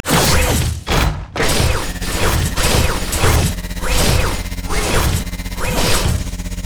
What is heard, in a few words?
emote dance; rumble skin03